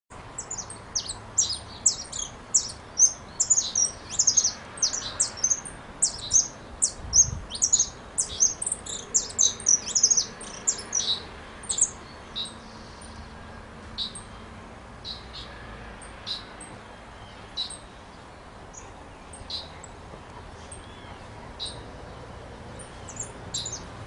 Jilguerito, Jilguero Dorado (Sicalis flaveola); Sicalis flaveola pelzelni

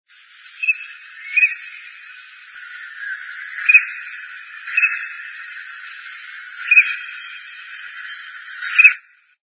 Pato barcino

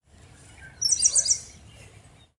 Tordo Renegrido, Tordo Renegrido (Molothrus bonariensis)

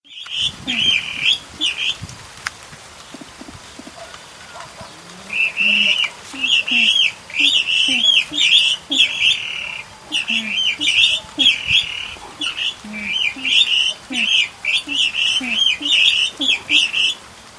Gallineta comun

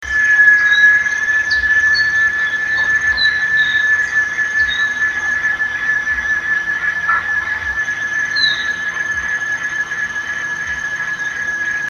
silbido, Chicharra Grande (Quesada gigas); aparece al atardecer; No fue posible verla ya que aparece al atardecer

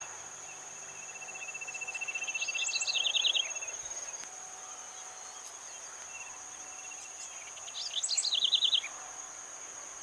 Nothura maculosa, mal grabado; Chajari

